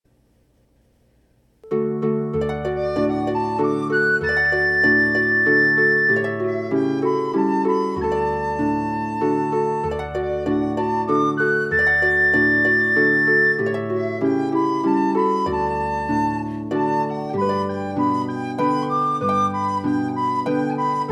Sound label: for flute harp; children hear who knocking